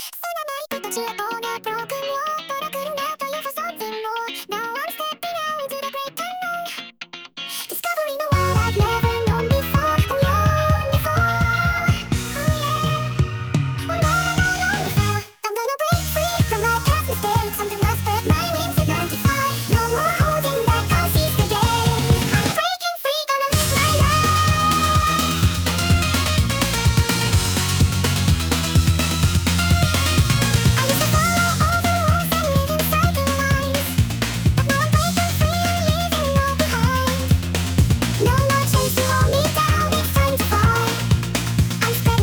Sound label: sped up